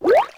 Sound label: Penguin Suit Swim; New Super Mario Bros. Wii